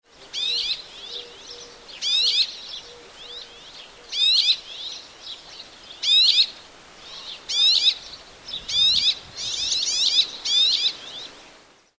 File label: rock sparrow